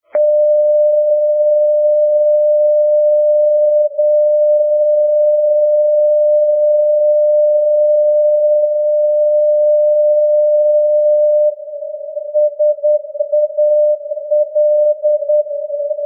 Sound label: ndb salon de provence sal 334; europe